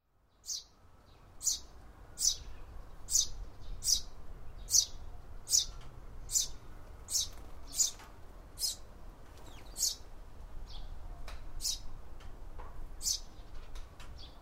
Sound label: baby suzume fall